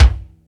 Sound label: acoustic kick drum one shot b key; kick drum samples